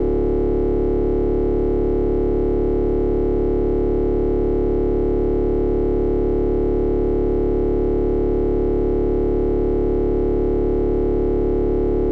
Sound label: Mixup output FOLDED SIN; modular; ac-coupling